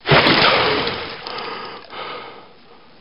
emerge; blood fresh supply

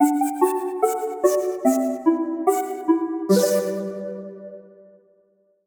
Jingle Fantasy; ashes of the night

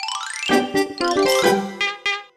Jingle Stage Before; Pig Medal; Wii U

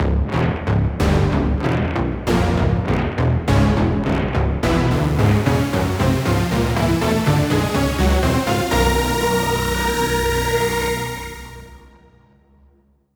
Boss Buildup

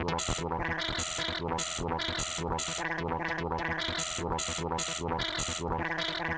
Cartoon

